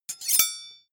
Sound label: sword blade grind short